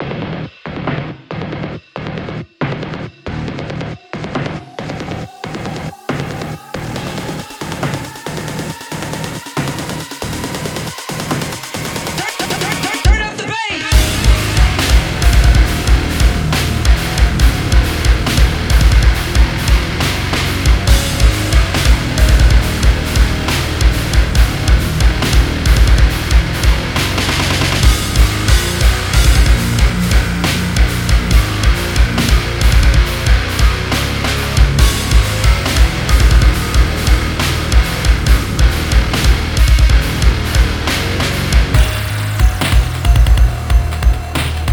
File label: Industrial Grindplates; Industrial Music Pack